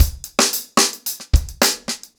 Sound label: 110BPM, ACOUSTIC DRUMS